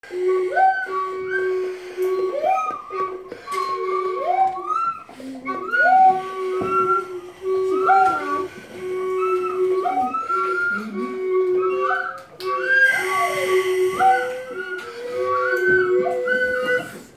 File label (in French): son flute